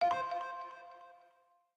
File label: pleasant unobtrusive sound signal of an incoming message